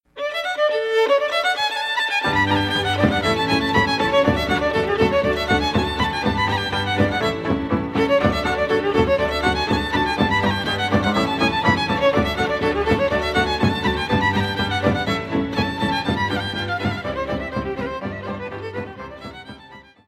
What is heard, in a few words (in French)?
Ardeleana, Roumanie